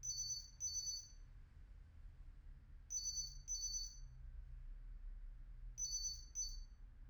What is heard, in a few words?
phone; IEEE AASP